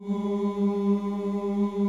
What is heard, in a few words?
A1 MALE CHOIR; CHOIR OOS